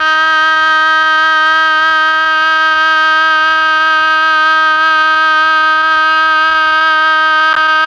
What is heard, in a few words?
MEL OBOE